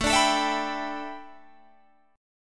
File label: A 7th Suspended 2nd Suspended 4th Guitar Open G